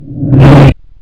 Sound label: Jump Scare